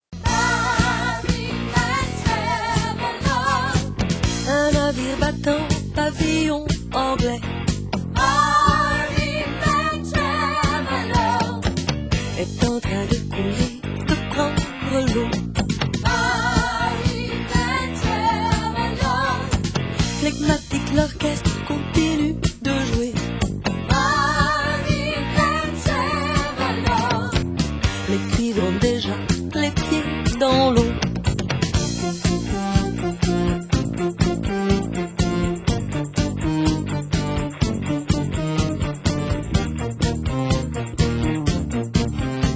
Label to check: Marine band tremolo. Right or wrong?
Right